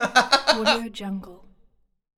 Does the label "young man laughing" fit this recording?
Yes